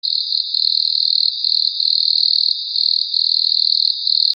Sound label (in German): waldgrille